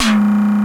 PTX TOM; Crypton - DrumBase5000

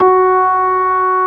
ORG.3 FIS4; B3 FULL

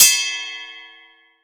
SPOKE HH, SPOKE HI HAT

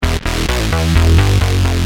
Pump b1, Bass; Industrial

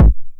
909 BD Tape Sat

